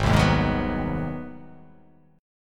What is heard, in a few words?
Ab 7th Sharp 9th Ab down, Ab7#9 chord; Ab7#9 strummed; JGuitar